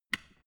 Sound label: switch small turn on soft